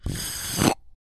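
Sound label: Suck Up, Sound of something being sucked up into a straw or intake of some sort. Could also be someone finishing a drink.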